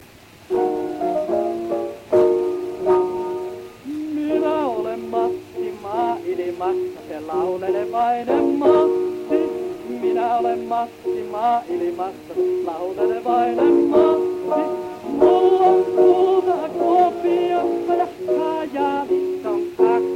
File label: Gramophone